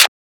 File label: Closed Hat; FLPRO